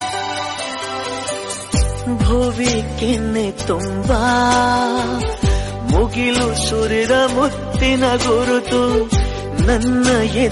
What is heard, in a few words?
kannada song